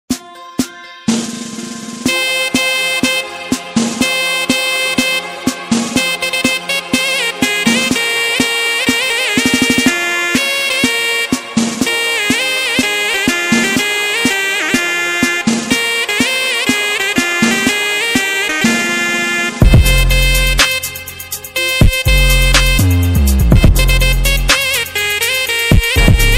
Mehter Marsi Remix

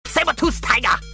Saber-toothed tiger

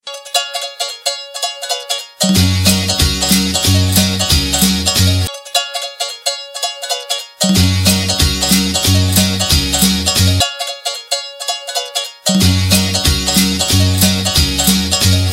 Tropical Vibes

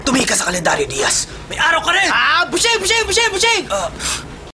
cornetto

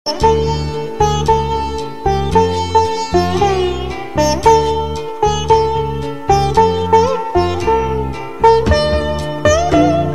Nice Veena Beats

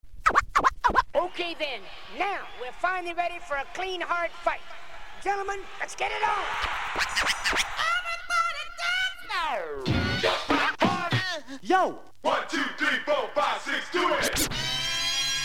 Dance Mixes

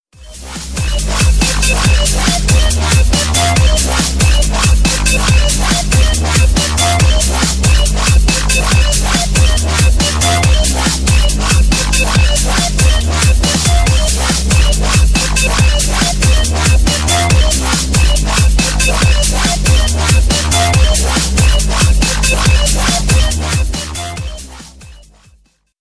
Breakbeat Ringtone

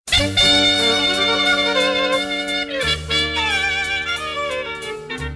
Old Sms Tone

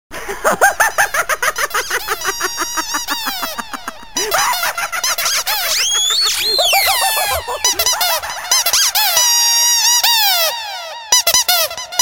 Ducktoy Lough Mix

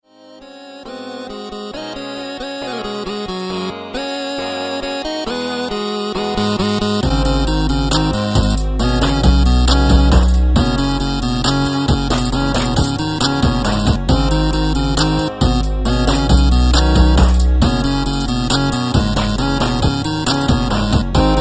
8 bits

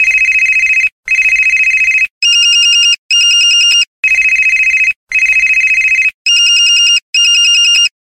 Alarm Clock

